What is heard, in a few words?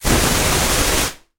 Flame Thrower Burst